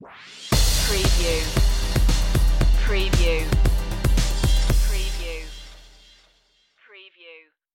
new dawn stinger

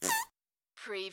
CARTOON FART WITH SQUEAK

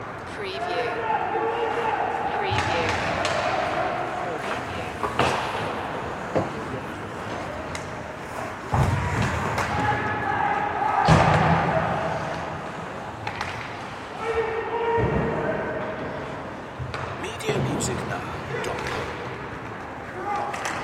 Hockey Match

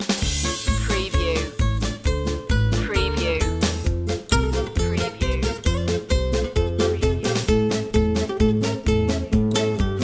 polka loop

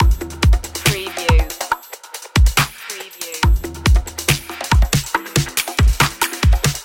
hip hop urban drums loop

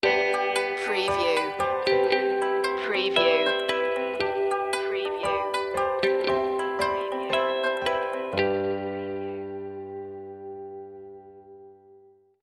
folk sting

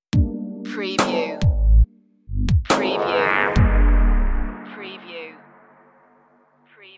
stigmata stinger

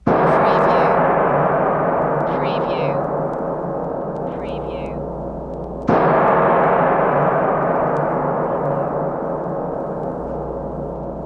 Gong Chinese Big Two Strikes

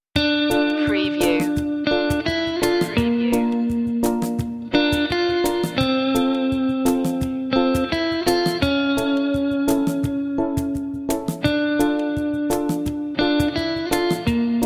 latin caribbean reggaeton

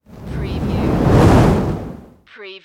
Fireball Large Flame Burst

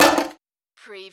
Button Sound Metal Can Drop

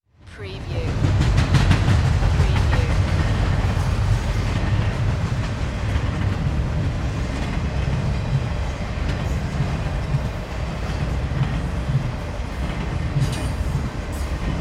Train Pass By; sound effects bible

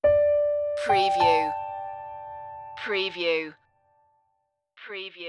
stockholm nights stinger

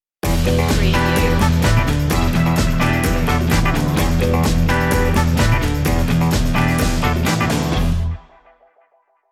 street grind stinger

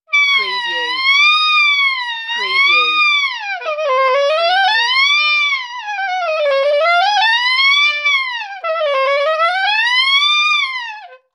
Sax Crying

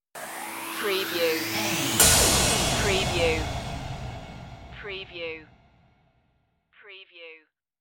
pop tv stinger

